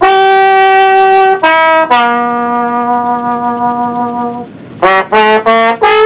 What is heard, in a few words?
trombone